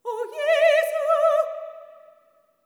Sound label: SOP. TRAD, Symphony of Voices; Spectrasonics